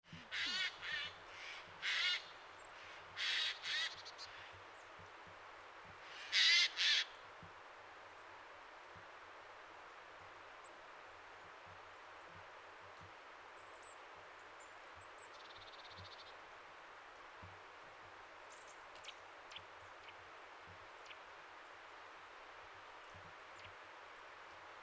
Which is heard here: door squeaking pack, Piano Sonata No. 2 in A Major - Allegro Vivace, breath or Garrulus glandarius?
Garrulus glandarius